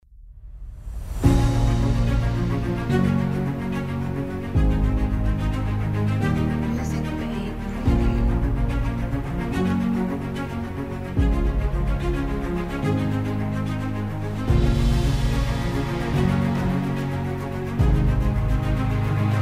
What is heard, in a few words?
Patriotic Dramatic Epic